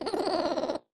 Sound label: Cry; Smolzy